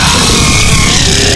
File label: charge, antlion; hl2